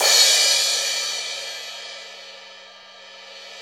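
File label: cymbal crash, Roland; Club-50 - Foundations Roland; Ext.Snare 3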